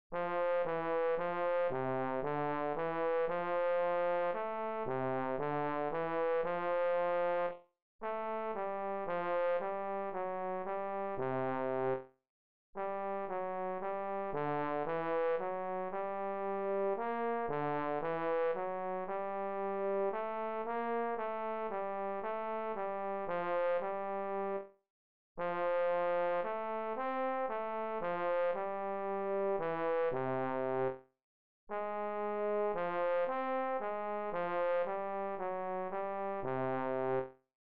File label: Euphonium slow, etudes; EUPHONIUM Lyrical; Region 23 Middle School Etudes for 2026-27 (Year C)